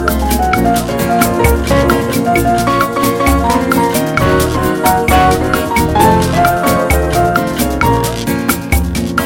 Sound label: Soft and Special Gitar